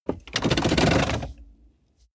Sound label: car bmw e46 interior seat pull forward completely rattle